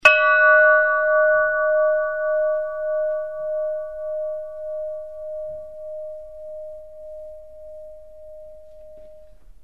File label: russian bells; zvons; Pyatkov